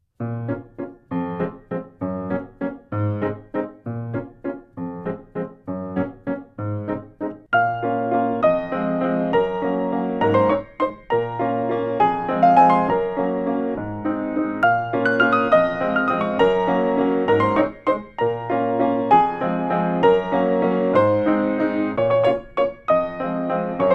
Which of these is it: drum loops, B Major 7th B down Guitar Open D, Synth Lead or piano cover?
piano cover